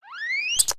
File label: Rat Hatchling Squeek; creatures organic